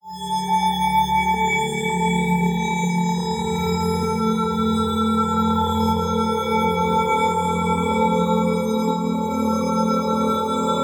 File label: Atmosphere; merlin